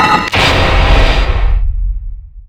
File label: spike trap plate; dota; conquest